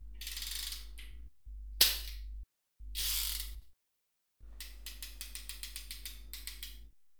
Revolver Clicks

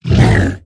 Large Monster Grunt Hit

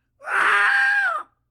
Screaming

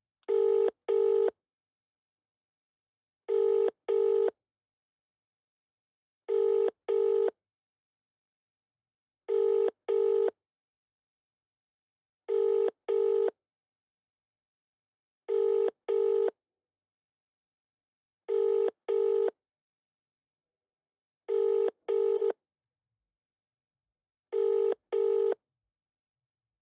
Phone Ring Out Call End Tone

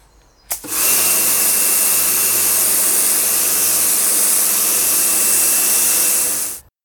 Karcher Pressure Hose

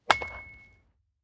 Baseball Hit

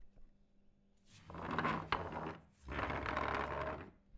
Dragging Wooden Chair

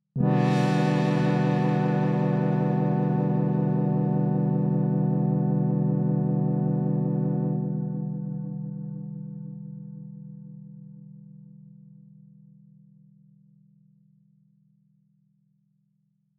Intro A minor

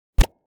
Toy Toy Button Press

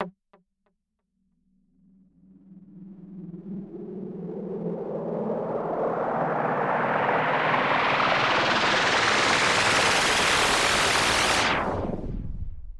Virus Filter fill

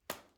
Hit

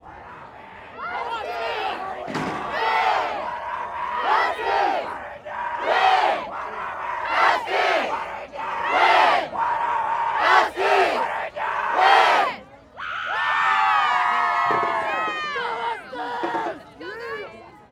Cheering

